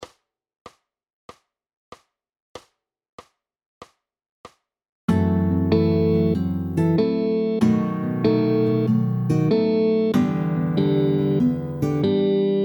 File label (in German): G Dur mit Shellch. swing 95bpm, gitarre